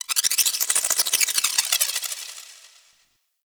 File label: Mountain Pass Sculpture Spin; The War Within; Oro